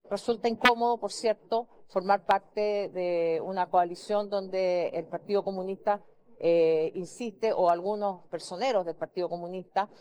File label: vocals